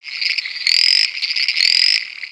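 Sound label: Pets Insect Large Angry; creatures; Lego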